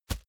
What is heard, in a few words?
plastic tense bag soft